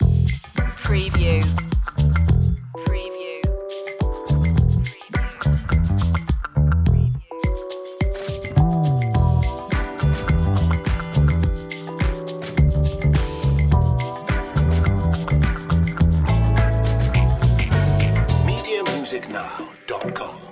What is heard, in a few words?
curious cat, A playful and funny bluesy track, with bouncy bass, quirky; funky groovy